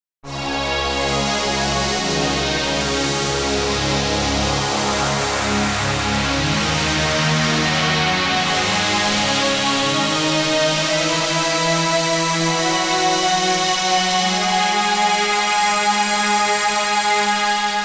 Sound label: a digital choir in the concert; the digital music